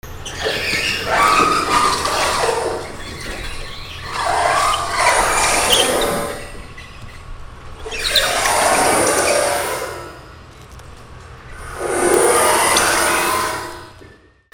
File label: FRICTION